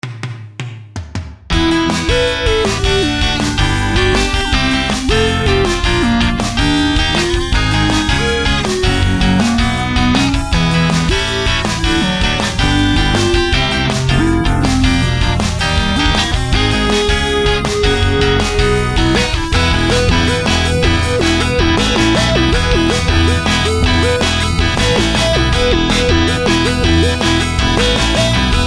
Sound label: Chords First